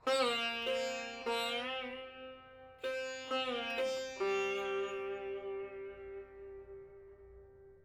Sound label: Sitar C min free time